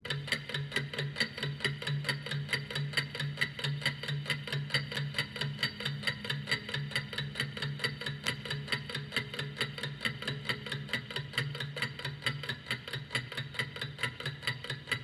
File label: Clock Ticking